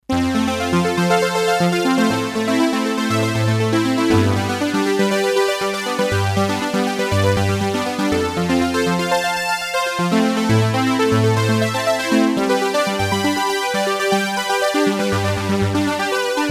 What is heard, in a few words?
ArpLayer, M-Audio Venom; Multi